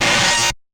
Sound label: Electro Troll E; martyn stonehouse